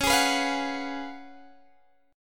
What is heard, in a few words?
C sharp 11th C sharp, Guitar, Open G